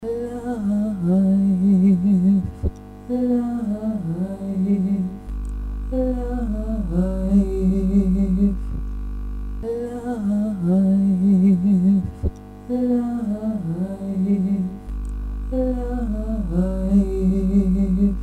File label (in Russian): Бэк Vocal Voice